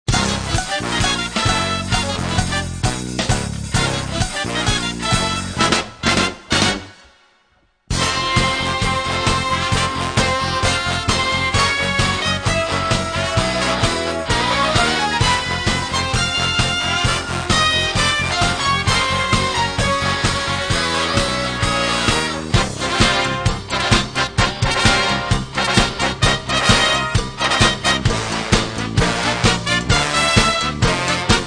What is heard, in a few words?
Fanfare & Punk